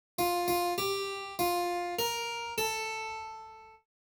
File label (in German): Rhythmus Ubungen; Notenlesen lernen